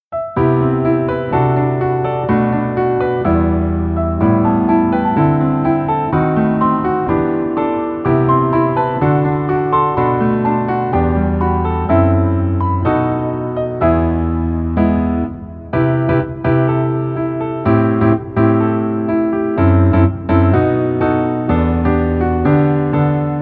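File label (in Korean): Piano version 멜로디 MR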